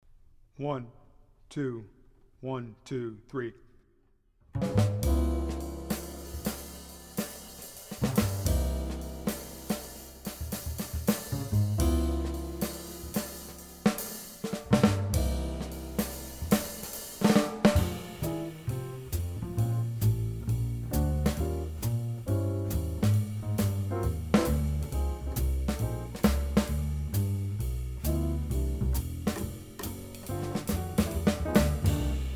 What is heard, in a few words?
jazz guitar play along track